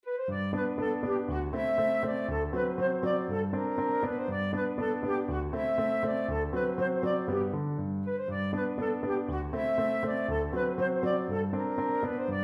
ecossaise, flute

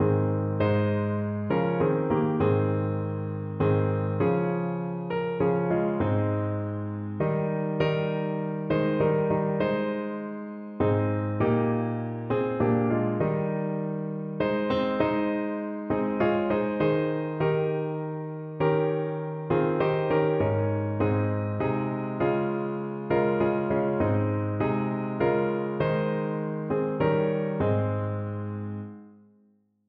piano

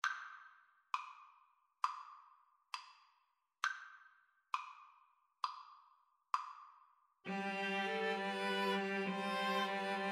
pastorale, String trio

